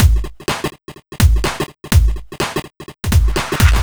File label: Disco No Hats, Drum Loops 125bpm, Nu Dance Electro; Disco2Disco